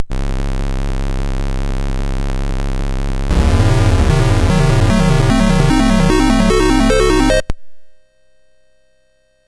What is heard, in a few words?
Dragonworld sid MOS6581R4